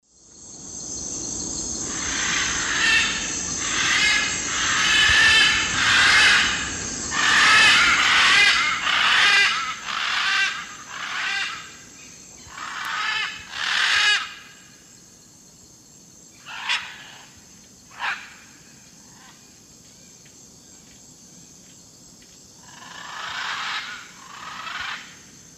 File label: Ara ararauna, calls pair landing; S. America; Eastern Lowlands